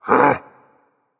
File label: confused berserk; cuchulainn